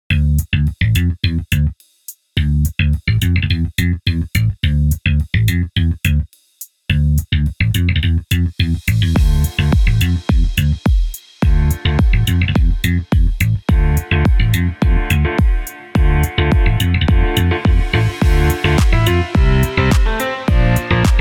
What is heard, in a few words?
piano bass; weeklybeats 2024